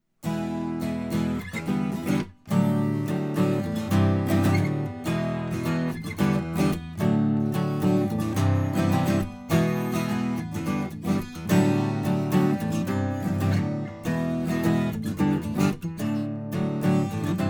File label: Taylor12; Neumann KM 184